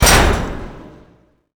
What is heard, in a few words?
punch catwalk